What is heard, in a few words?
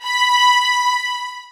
SOFT VIOLINS